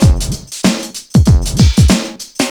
96 bpm drum groove e key, kick tuned to the E note